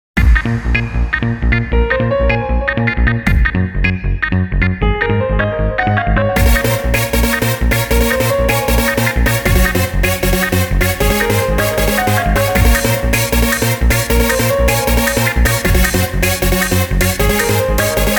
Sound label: TRANCE PIANO; ピアノの旋律がクールなトランスです。; [BPM:155]; トランス クール スタイリッシュ